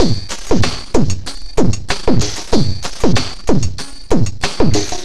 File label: big slam, 95bpm